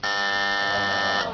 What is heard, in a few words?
strike; australia